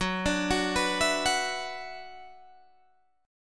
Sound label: F sharp 7th Suspended 4th Sharp 5th F sharp down Guitar DADGAD